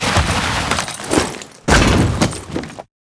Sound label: bt pilot embark casual behind; Titanfall 2